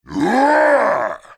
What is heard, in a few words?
shout; shout juren nuhou